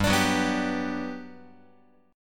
G Major 7th, G, down, Guitar; strummed; JGuitar

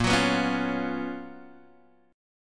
Gb Major 11th Bb, chord

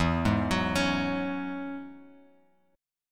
E 6th Add 9th E down Bass Standard; E6add9 arpeggiated; JGuitar

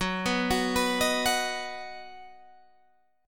Eb Minor Sharp 5th, Gb

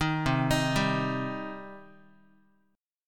C Minor C down Guitar; arpeggiated; JGuitar

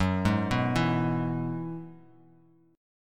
Gb 7th Suspended 2nd Suspended 4th, Bass Standard; arpeggiated; JGuitar

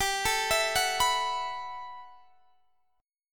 B 7th Suspended 4th G; arpeggiated

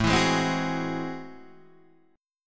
A Minor Major 13th, down, Guitar, Open D

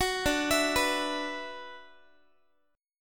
D 6th Add 9th D, Guitar; D6add9 arpeggiated; 21,22,19,21,x,x; tuning 4A,4E,5A,5D,5G#,6C#

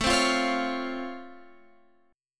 C sharp Augmented Major 7th A, chord

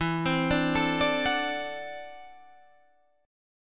D 9th E, guitar; D9/E; arpeggiated

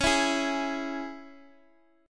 Db Minor, Guitar Open G

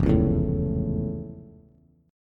F Augmented Major 7th F Bass

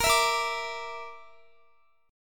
A sharp Major Flat 5th, Guitar; A#Mb5; strummed; x,x,20,21,22,21

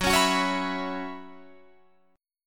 Gb 7th Suspended 4th, Guitar Open F; Gb7sus4 strummed

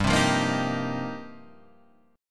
F 7th Suspended 2nd Suspended 4th

